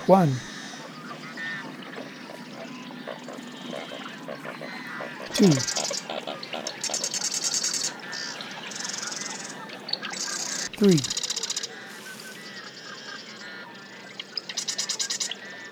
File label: Stereo Pattern Test; NT2000